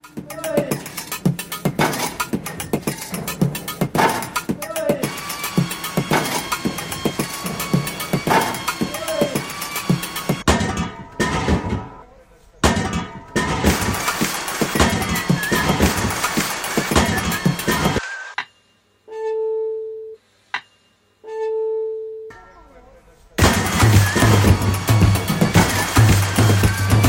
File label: orchestra; Lisboa